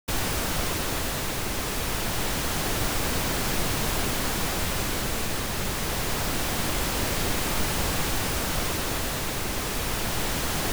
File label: Continuous wideband stereo pan; Stereo Setup and Imaging; Surround Spectacular